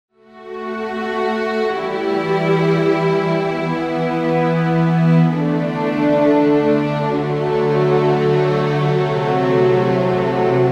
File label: 40 Strings